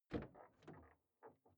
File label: car door open exterior hummer h3